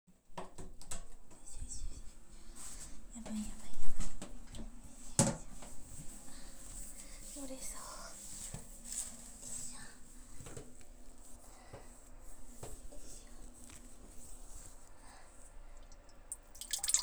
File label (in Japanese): おしっこ 夕方の我慢の末のおしっこ